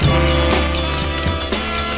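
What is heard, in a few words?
horn break, 120 bpm; MELODIC LOOPS; SAMPLED MUSIC LOOPS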